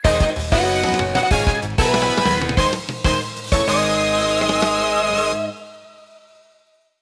Top Goal Place Fanfare; Mario Kart 8 Deluxe; Grand Prix, VS, & Battle

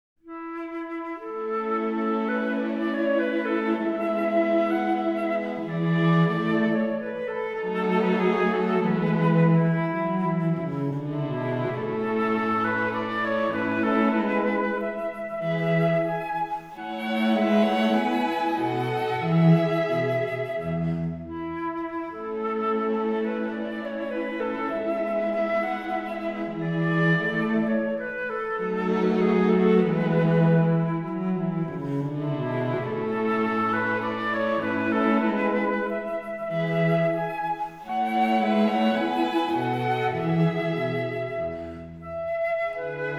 flute, String Quartet; in A, K.298; I. Thema; Mozart Flute Quartet

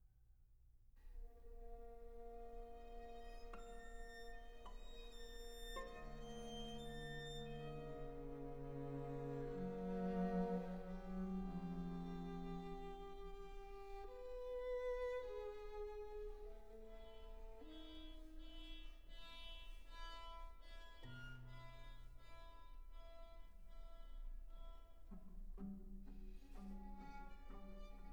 String Quartet, Sehr langsam; Six Bagatelles for String Quartet, op. 9

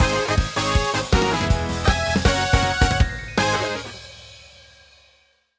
Mid Goal Place Fanfare; Mario Kart 8 Deluxe; Grand Prix & VS

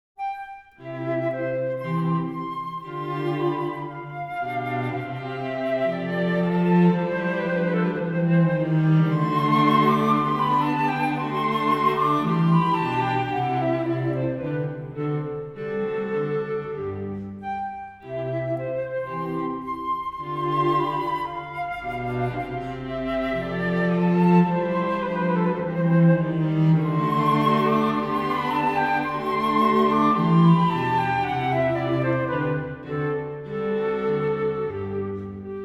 flute, String Quartet; in C, K.285b; II. Thema; Mozart Flute Quartet

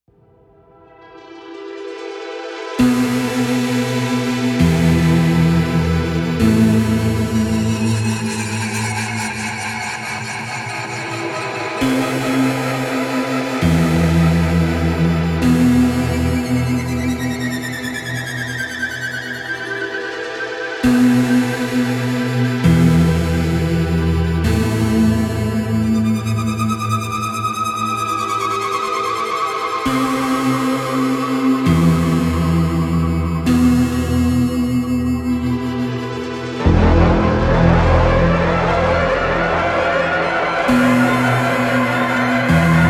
electronic music; Mr. Robot, Vol. 6

